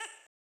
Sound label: Duck, Old Skool House, 120bpm; Big Fish Audio; Construction Kits; House of Amnesia